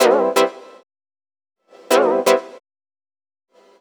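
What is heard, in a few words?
synth, Roots Funk; USB Soundscan vol.41; AKAI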